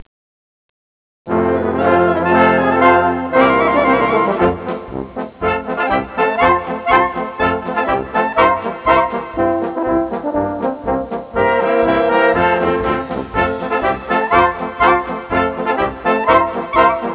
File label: Polka